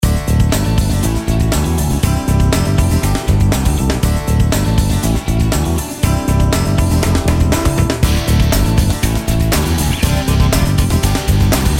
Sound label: Grooving pop rock